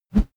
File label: whoosh bamboo; swooshes-swishes